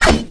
dual swing

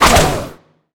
Sudden Strike

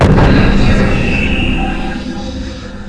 fire

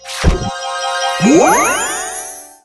System Skill Blue Effect Loop

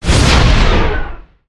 Dark Force effect

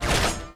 Robot idle

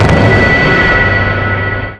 darken hand

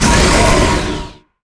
Cancellation effect

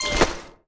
Cube Close

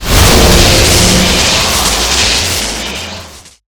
Magic Ball

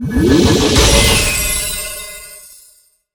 Exp UP effect

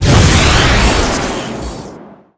Dragon Gale

